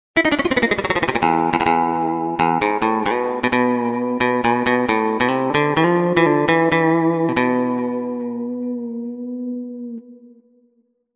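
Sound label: surf guitar; TURBO VL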